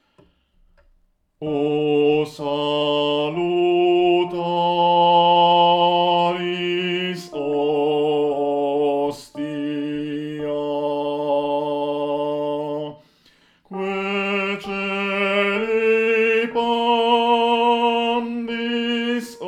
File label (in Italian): Contralti; O SALUTARIS HOSTIA